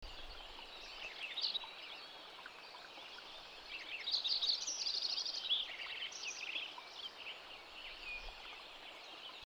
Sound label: Dawn In Wyoming